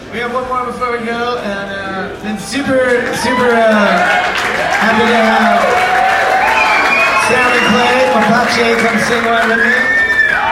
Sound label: encore applause